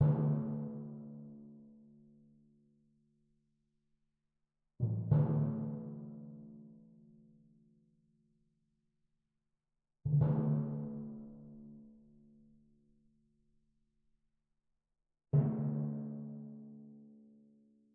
Timpani Loop

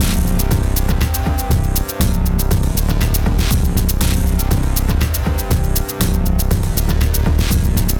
Music Combat; Carebotz

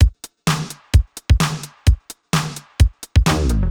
Intro, 129 bpm; fashion police squad beta

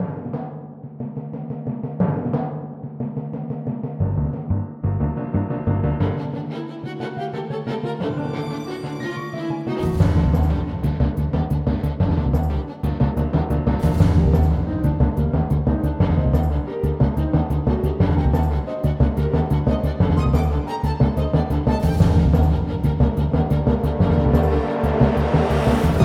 Combat Theme; windscape switch windows xbox one gamerip 2016

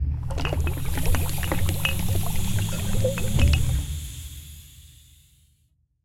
sfx crafting colour palette

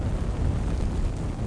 fire; Conquer Online 2.0

